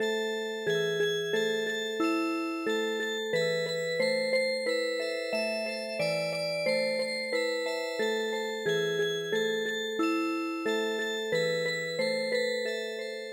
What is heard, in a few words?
Trio Music Box